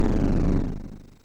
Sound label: SFX, MOS6581; Narco Police